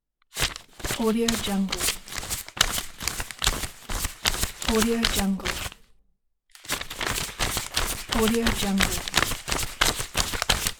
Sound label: money